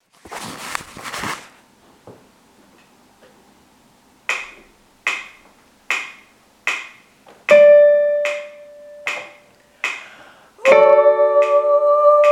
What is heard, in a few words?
sopran